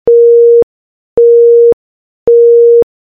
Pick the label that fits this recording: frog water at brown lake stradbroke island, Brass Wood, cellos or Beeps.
Beeps